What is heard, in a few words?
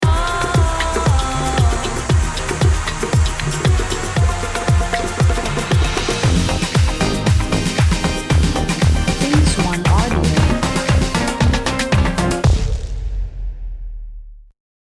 Song EDM Alternative